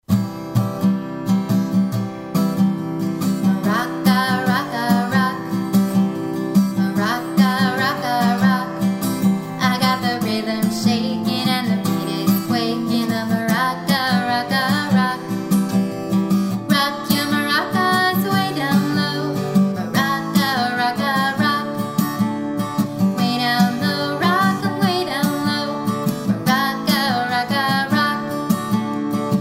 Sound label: maraca rock